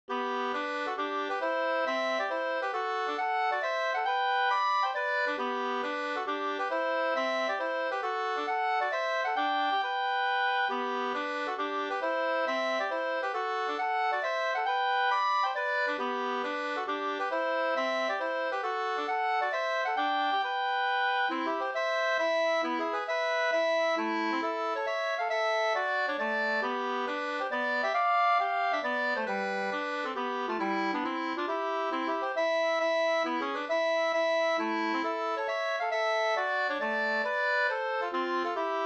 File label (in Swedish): mazurka